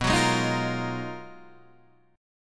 Ab Minor 13th, B, guitar chord

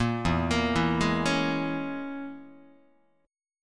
E 6th Flat 5th E down Guitar; E6b5 arpeggiated; JGuitar

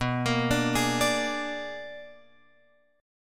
B-Minor Major 13th; {7 X 8 7 9 10} arpeggiated

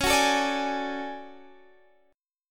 D Major Sharp 11th C sharp, chord

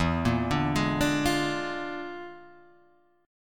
E 7th Flat 5th Flat 9th; E7b5 B9 arpeggiated; JGuitar

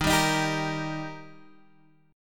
Eb Major Eb down Guitar Standard; strummed

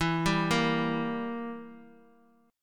E Diminished down

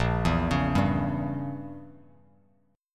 A sharp Diminished 7th, Bass Standard; arpeggiated; JGuitar

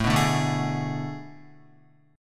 A Major 7th Suspended 2nd Suspended 4th, Guitar; AM7sus2sus4 strummed; JGuitar

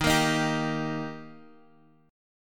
Eb Major Eb down; strummed; JGuitar

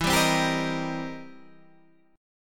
C 7th Sharp 9th E

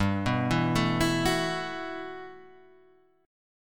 B 7th Suspended 4th G; B7sus4/G; arpeggiated; JGuitar

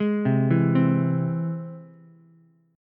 G sharp Augmented G sharp Ukulele; G#+ arpeggiated; JGuitar

